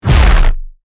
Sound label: Hit Distortion; Sound Design; BlastWave